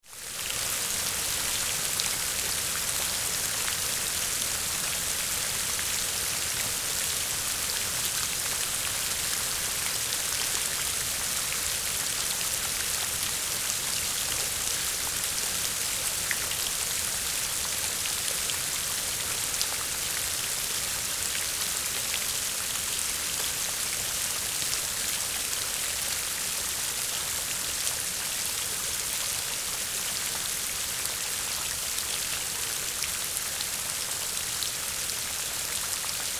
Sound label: Rain Medium Light and Splashy; Rain Sound Effects